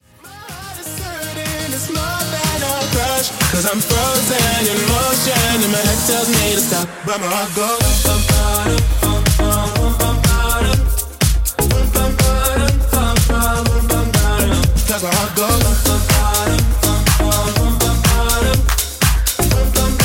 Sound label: REDRUM 123 bpm Ab